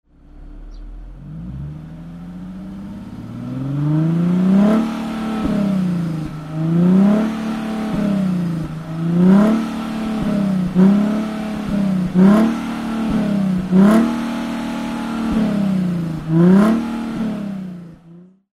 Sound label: Blip